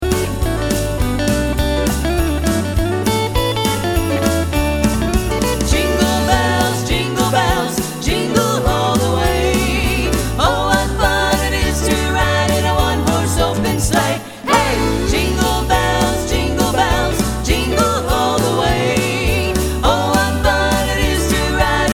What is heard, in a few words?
Jingle Bells